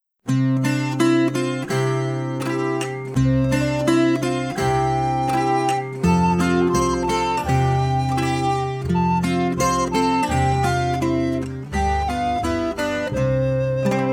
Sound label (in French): Le poussin pepie instrumental